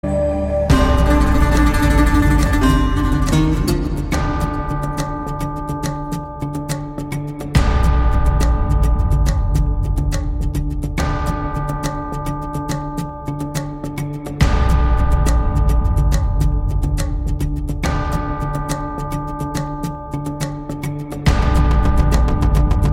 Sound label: Vande Mataram Karaoke BGM